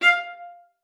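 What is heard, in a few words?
F5, viola spiccato; Xsample VOL-5; 90 s Sample CDs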